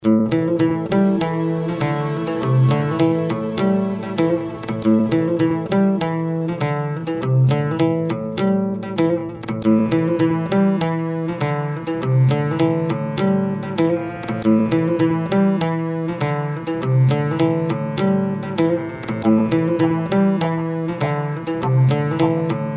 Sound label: ORIENTAL